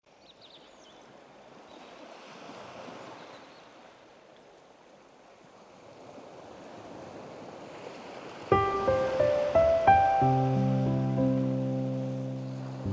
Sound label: Ocean Relaxing Music